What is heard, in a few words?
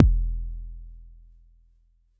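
Long bass drum, Jomox Airbase99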